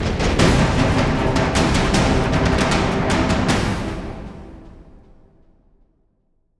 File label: Stinger Fill